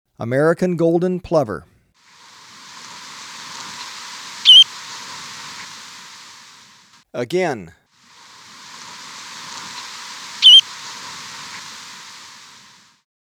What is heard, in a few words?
American Golden Plover, Birds of Ecuador